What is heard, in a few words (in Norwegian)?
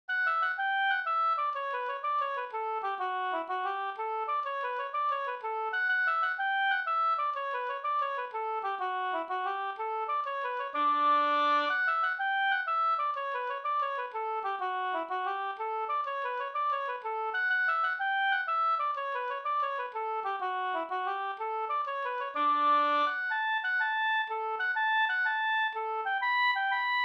pols Rorospols; Nattliv pa Henningsgarden no